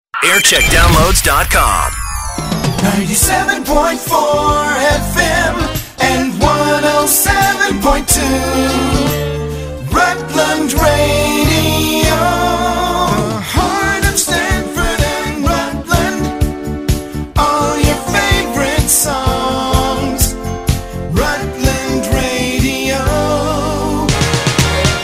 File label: jingles; Rutland Radio Stamford; Jingle Montage (JAM) Dec 13th 1998; 1990s